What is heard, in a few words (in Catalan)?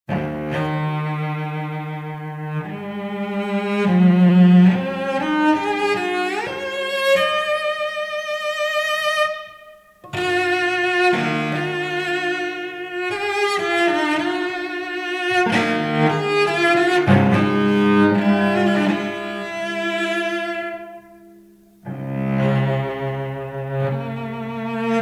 sardana, cellam solo; g.kasado